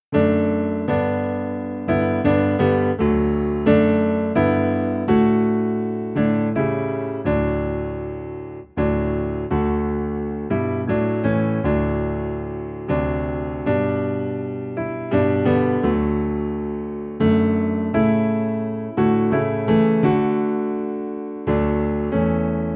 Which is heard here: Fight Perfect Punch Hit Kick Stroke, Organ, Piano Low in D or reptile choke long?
Piano Low in D